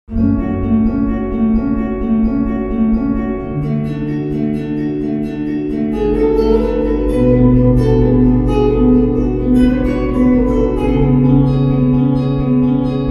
Romantic Ringtone

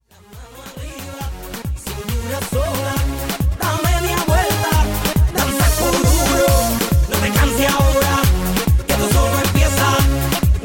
english ringtone

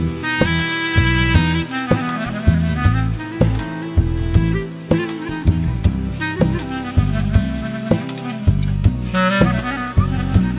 Malayalam Hit

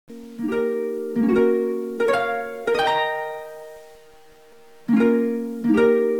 Harp Ringtone; Huawei Mate 40 Pro

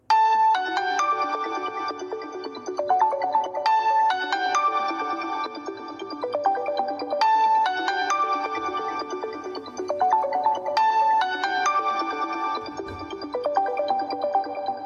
Huawei Tune Living Original Ringtone

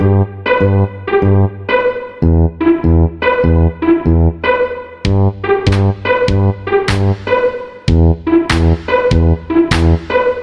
Infinix Ringtone; Infinix Hot 8 Lite

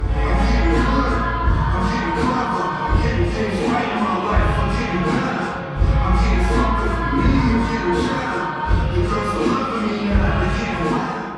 Children Sing Ringtone

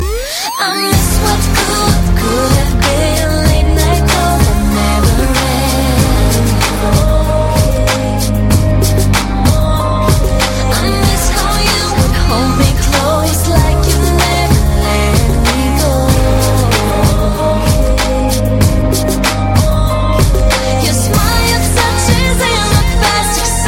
Sad English Ringtone

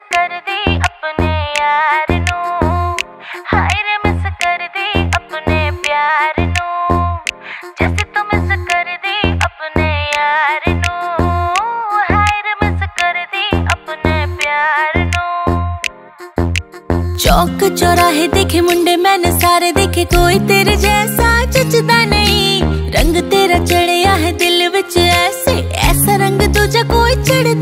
Punjabi Song Ringtones

